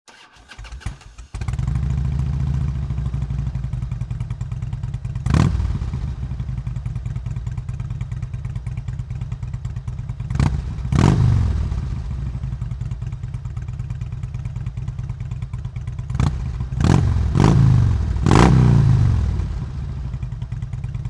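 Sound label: zvuki motocikla, motorcycle sounds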